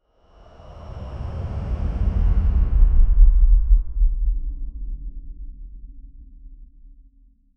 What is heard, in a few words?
a deep ominous dark swoos; energy sweep